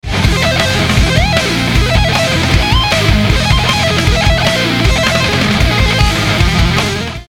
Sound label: sweep